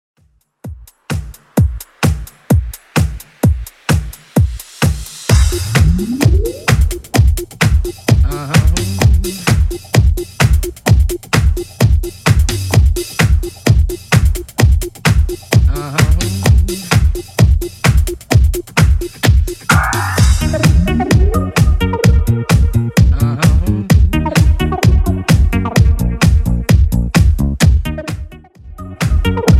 90s Redrum 129bpm

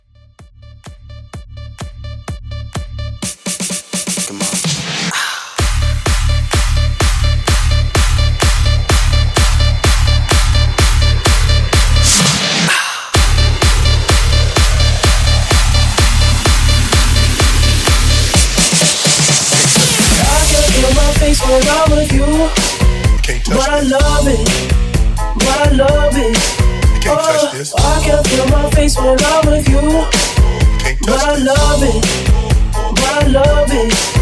Party Mashup Bootleg 127 Bpm

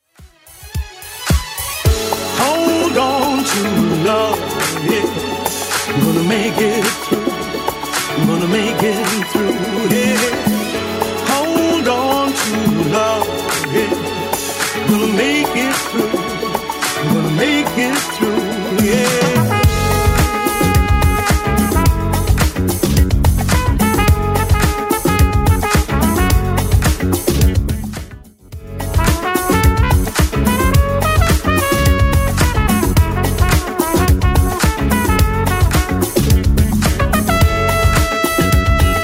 80s Club Edit 108bpm

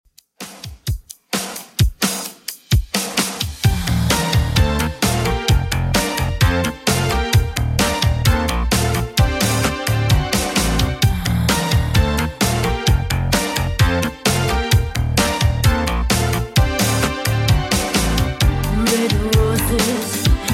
80s Redrum 130bpm

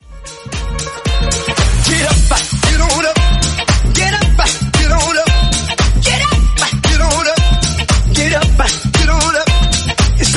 Redrum 114 bpm Ebm

